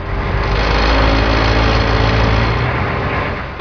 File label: vehicle